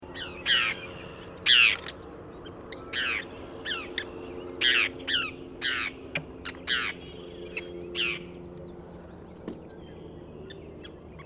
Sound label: Forsters Tern, Sterna forsteri; gulls terns